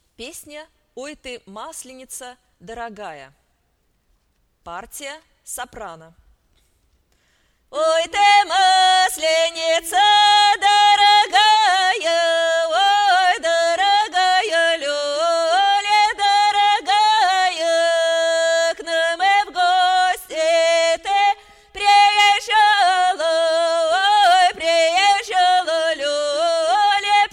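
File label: oity soprano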